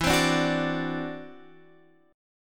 E 7th Suspended 2nd Sharp 5th E down Guitar Drop D